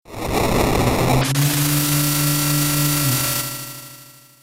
meteor shorter; ff3us

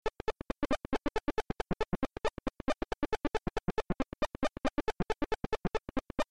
weird beat; ff3us

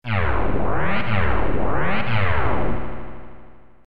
weird; ff3; ff3us

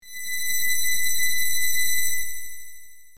eerie short; ff3us

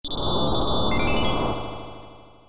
healing wind; ff3; ff3us

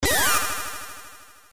switch; ff3; ff3us

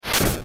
flail hit; ff3us

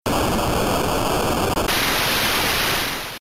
machine power up; ff3us

